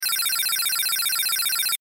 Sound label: Video Game Beeps; Vintage Beep Chime; Technology; Video Games; Blastwave